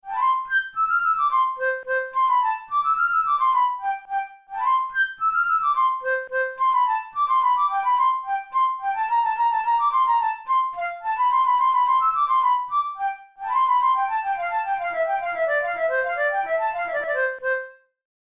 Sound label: hornpipe, flute; sullivan; ruddigore